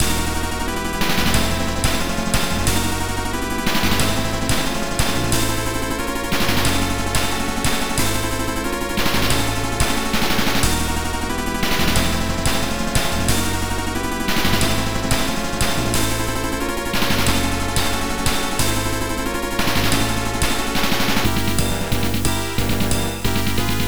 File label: Evil Storm; mirage 2 pc 9821 gamerip 1994